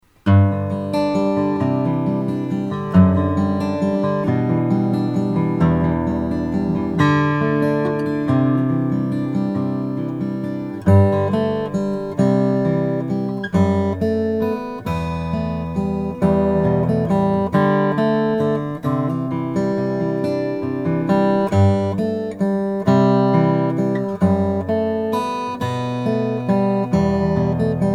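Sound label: Brazilian rosewood acoustic guitar; traugott r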